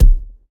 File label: Ball Player Hand Hit Tackleball Hard